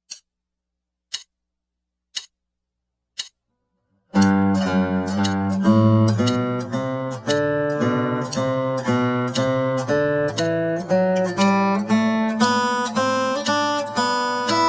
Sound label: pickings studies in G slow